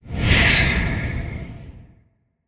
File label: Damned Tourment Wind